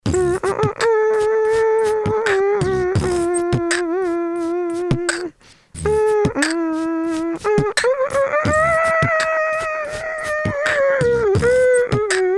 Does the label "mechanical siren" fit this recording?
No